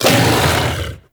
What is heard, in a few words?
Kubrow Armored Vocal Attack Medium; Warframe